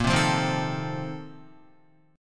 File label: Bb Major 7th Suspended 2nd Suspended 4th Bb down Guitar, BbM7sus2sus4 chord; BbM7sus2sus4 strummed; JGuitar